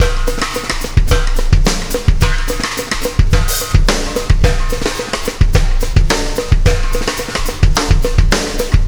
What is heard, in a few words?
Wormhole Beat, Processed Drumkits